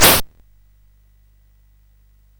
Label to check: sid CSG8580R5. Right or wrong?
Right